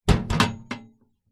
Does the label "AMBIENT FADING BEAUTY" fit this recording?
No